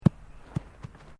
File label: Sport Ball Rubber Bounce Grass Sequence, Rubber ball